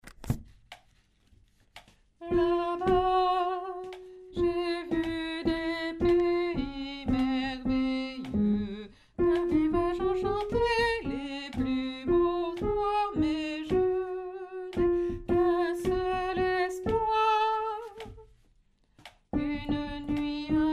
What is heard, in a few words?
alto